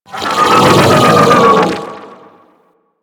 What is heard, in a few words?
Vocal Death; Amalgam Override; Warframe